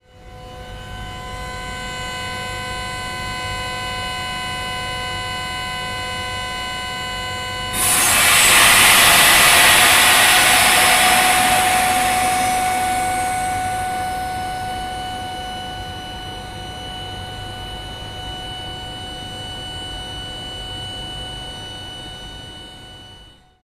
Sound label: Compressor